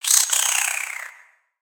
Spider Idle Bored; Lego; creatures mechanical

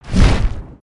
Dragon Wing Flap

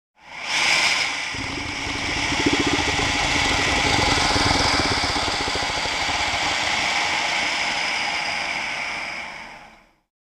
Jungle Aligator; gnarled forest

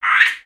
Pets Skunk Speak; creatures; Lego

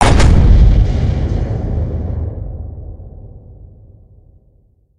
Smash Sound; foley; Frakjaw

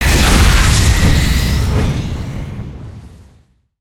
Spinjitsu Fire Tornado Start

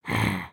Idle Bored, creatures organic; Lego; Strombie

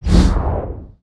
Full Swing; Summoner Valiant

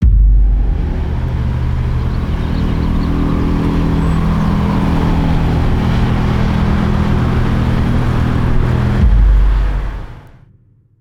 Pet Rock Binoc, ambience

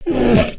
Pet Panda Speak; creatures pets

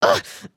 Angry, emotes

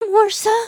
beg

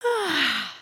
sleep start, emotes

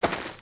Footstep; Lego; Strombie; creatures organic

